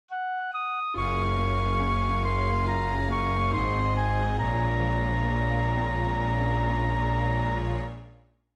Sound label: MIDI Defeat; Star Merc